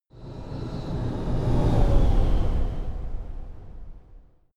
sci-fi vehicle train passing; raumschiff

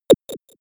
cracking synth small impact

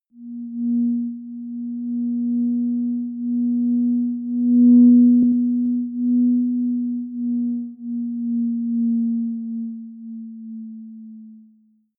simple moving sine long; toene

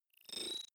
sci-fi expand slide tonal; sweeps-swells

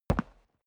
step boots concrete single left; menschen; schritte

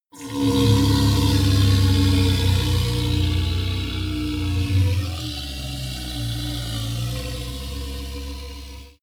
golem growl domination long; schreie

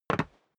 step boots single wood parquet single stair up; Footsteps; schritte

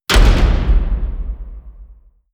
slam, tueren

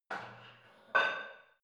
barbell light plate put on hit reverby soft

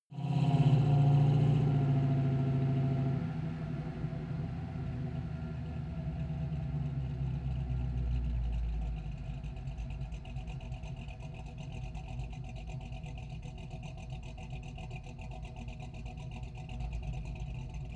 car camaro slow down exhaust

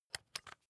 rock drill head remove regular; bohrer; soundpool

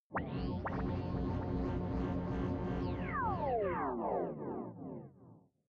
sci-fi night fall small planet, drones

